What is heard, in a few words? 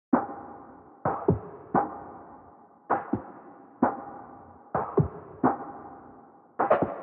Pop Kit 130bpm Percussion; EDM Drums; Drum Kits; Stems; Ghosthack; Drum Mega Bundle; Ultimate EDM Drums; Pop Kit 11